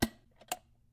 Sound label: Teapot Open; Game rip; MiSide